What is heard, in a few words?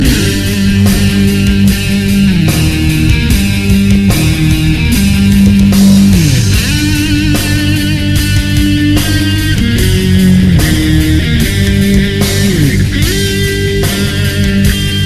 rock